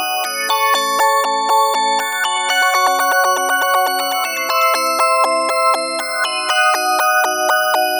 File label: Synth Arpeggio, Synths; Eclectic Electronica